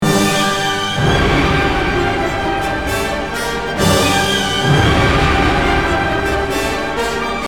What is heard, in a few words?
Theme From Liszts Dantes Symphony; 4th movement; symphony1